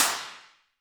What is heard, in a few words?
Roland TD-20 Percussion